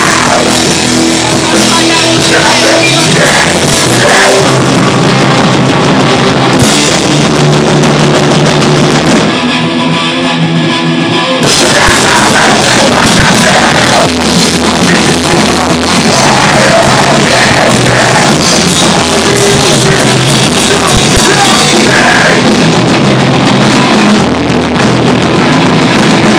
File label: Screamcore